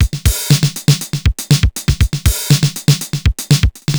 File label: TR 505 (120BPM), beats; musicradar; cyborg beats